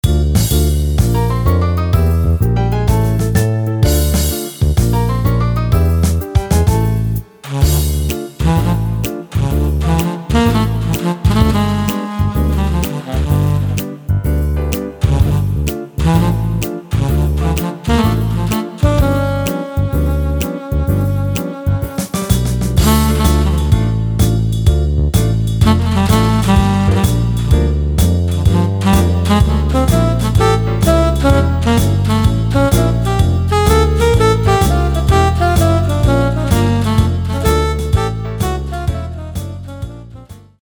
Panther Swing, Yamaha PSR-550

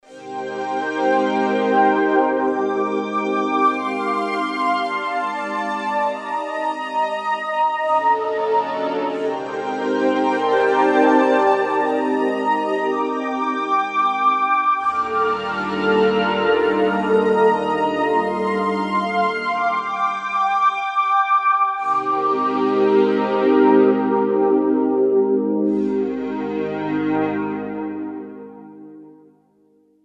Metal 7th Pad; Roland SC-880; Factory Patches

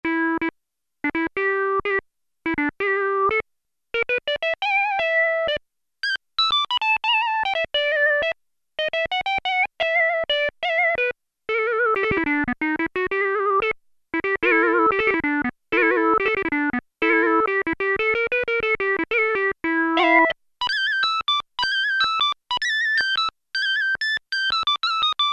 Lead I, Roland Juno-106; Factory Preset

